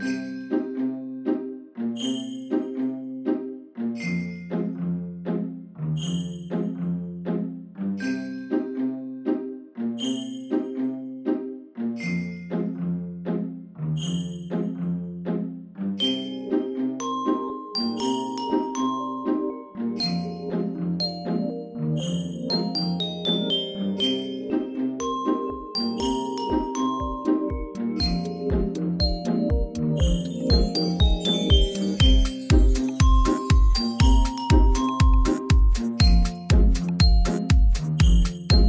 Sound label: lobby tune christmas electronic